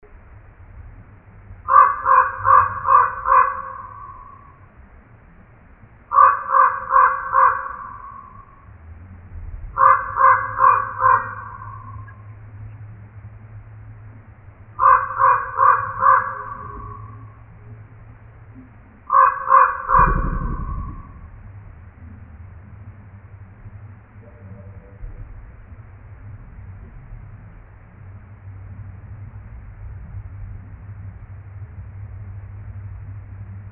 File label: Common Raven